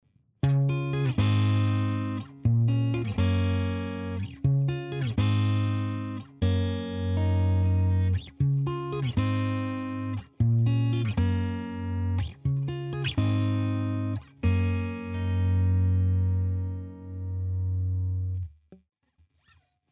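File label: Riff processed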